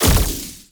accelerator Lightning; Mindustry

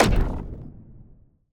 shockwave Tower; Mindustry

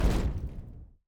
block Explode; Mindustry; explosions

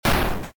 bang; Mindustry

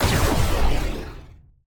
shoot Foreshadow; Mindustry

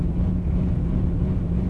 loop Machine Spin; loops; Mindustry

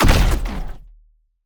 accelerator Construct; Mindustry

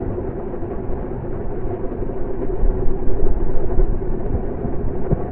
loop Drill; loops; Mindustry

